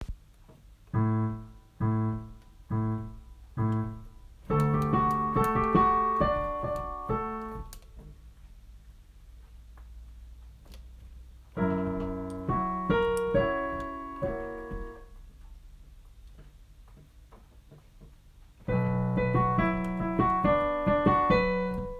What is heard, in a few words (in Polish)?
echo rytmiczne